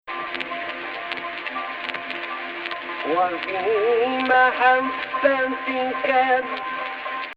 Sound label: AHH, Egypt Rast